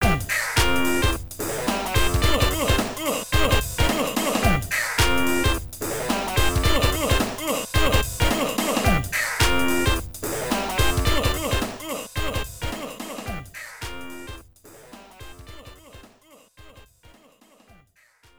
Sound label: Bonus Tally; Spider-Man The Videogame; System 32; Arcade